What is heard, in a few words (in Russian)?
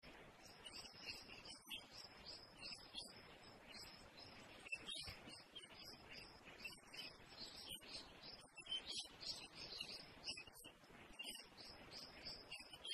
snegir